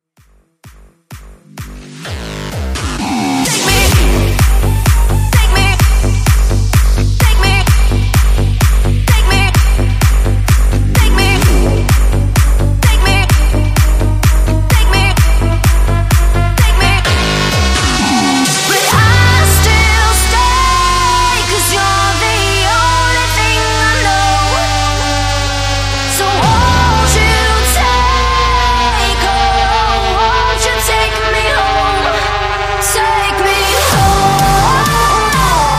EDM Bounce Smashup

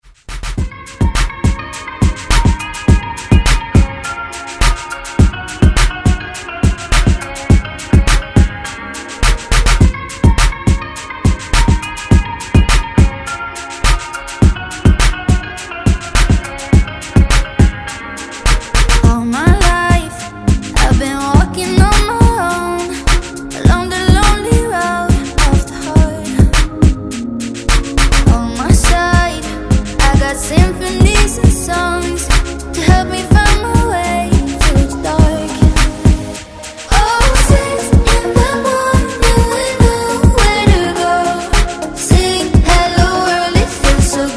Top40 Redrum

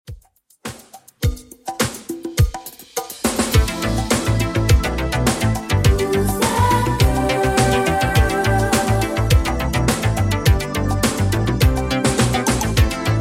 80s Redrum 104 bpm

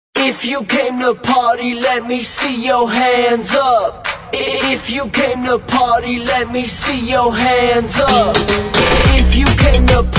Clapella intro

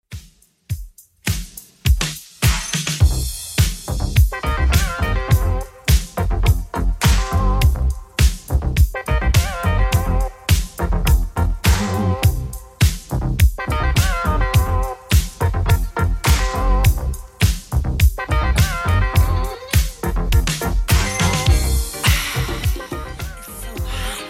80s Redrum 104 bpm

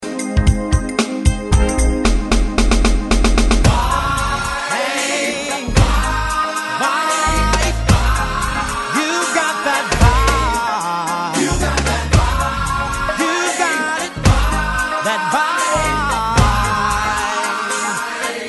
90s Intro 113Bpm Clean; DJDOLLS

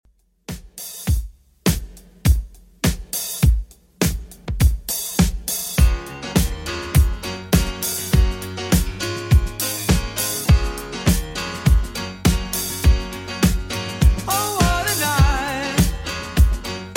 70s Redrum 102bpm; remixplanet

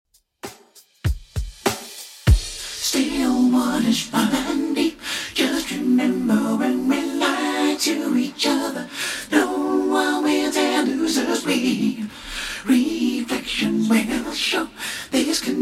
90s Redrum 98bpm CLEAN

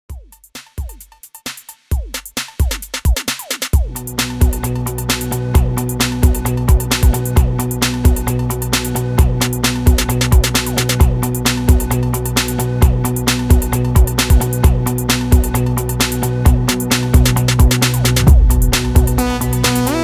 EXTENDED 132 BPM; REDRUM

